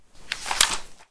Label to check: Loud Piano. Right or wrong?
Wrong